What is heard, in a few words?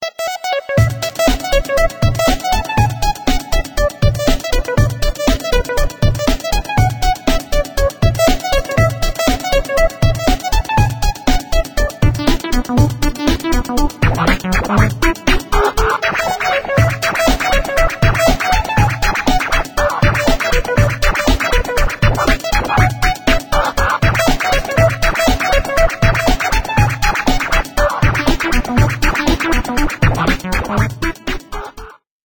scratch mix